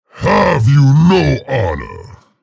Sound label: pain ability damage; scalebearer; low health